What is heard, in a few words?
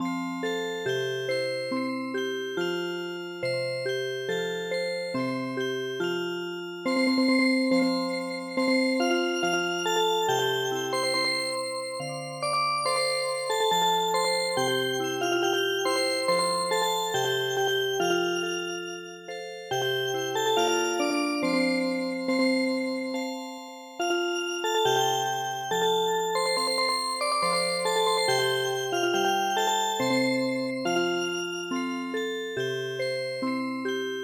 Music Box